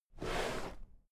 drawer cabinet equipment open regular halfway

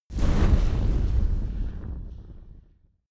campfire small extinguish

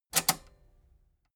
metal button robust spring click enable fast

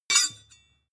sword blade grind

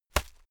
hit plastic fleshy hard

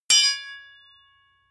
sword impact metal ring heavy

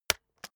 keyboard external space hit slow

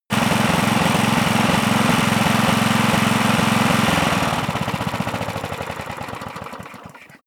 engine small turn off

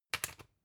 usb port metallic in hard

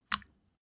step barefoot wet hard

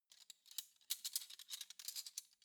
cutlery spoons handle soft

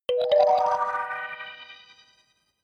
notification kalimba magical triad up minor high

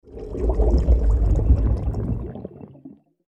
bubbling mid freq

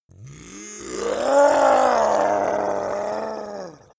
zombie human change

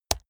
keyboard laptop space push hard

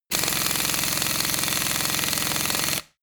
impact wrench screw single long dry

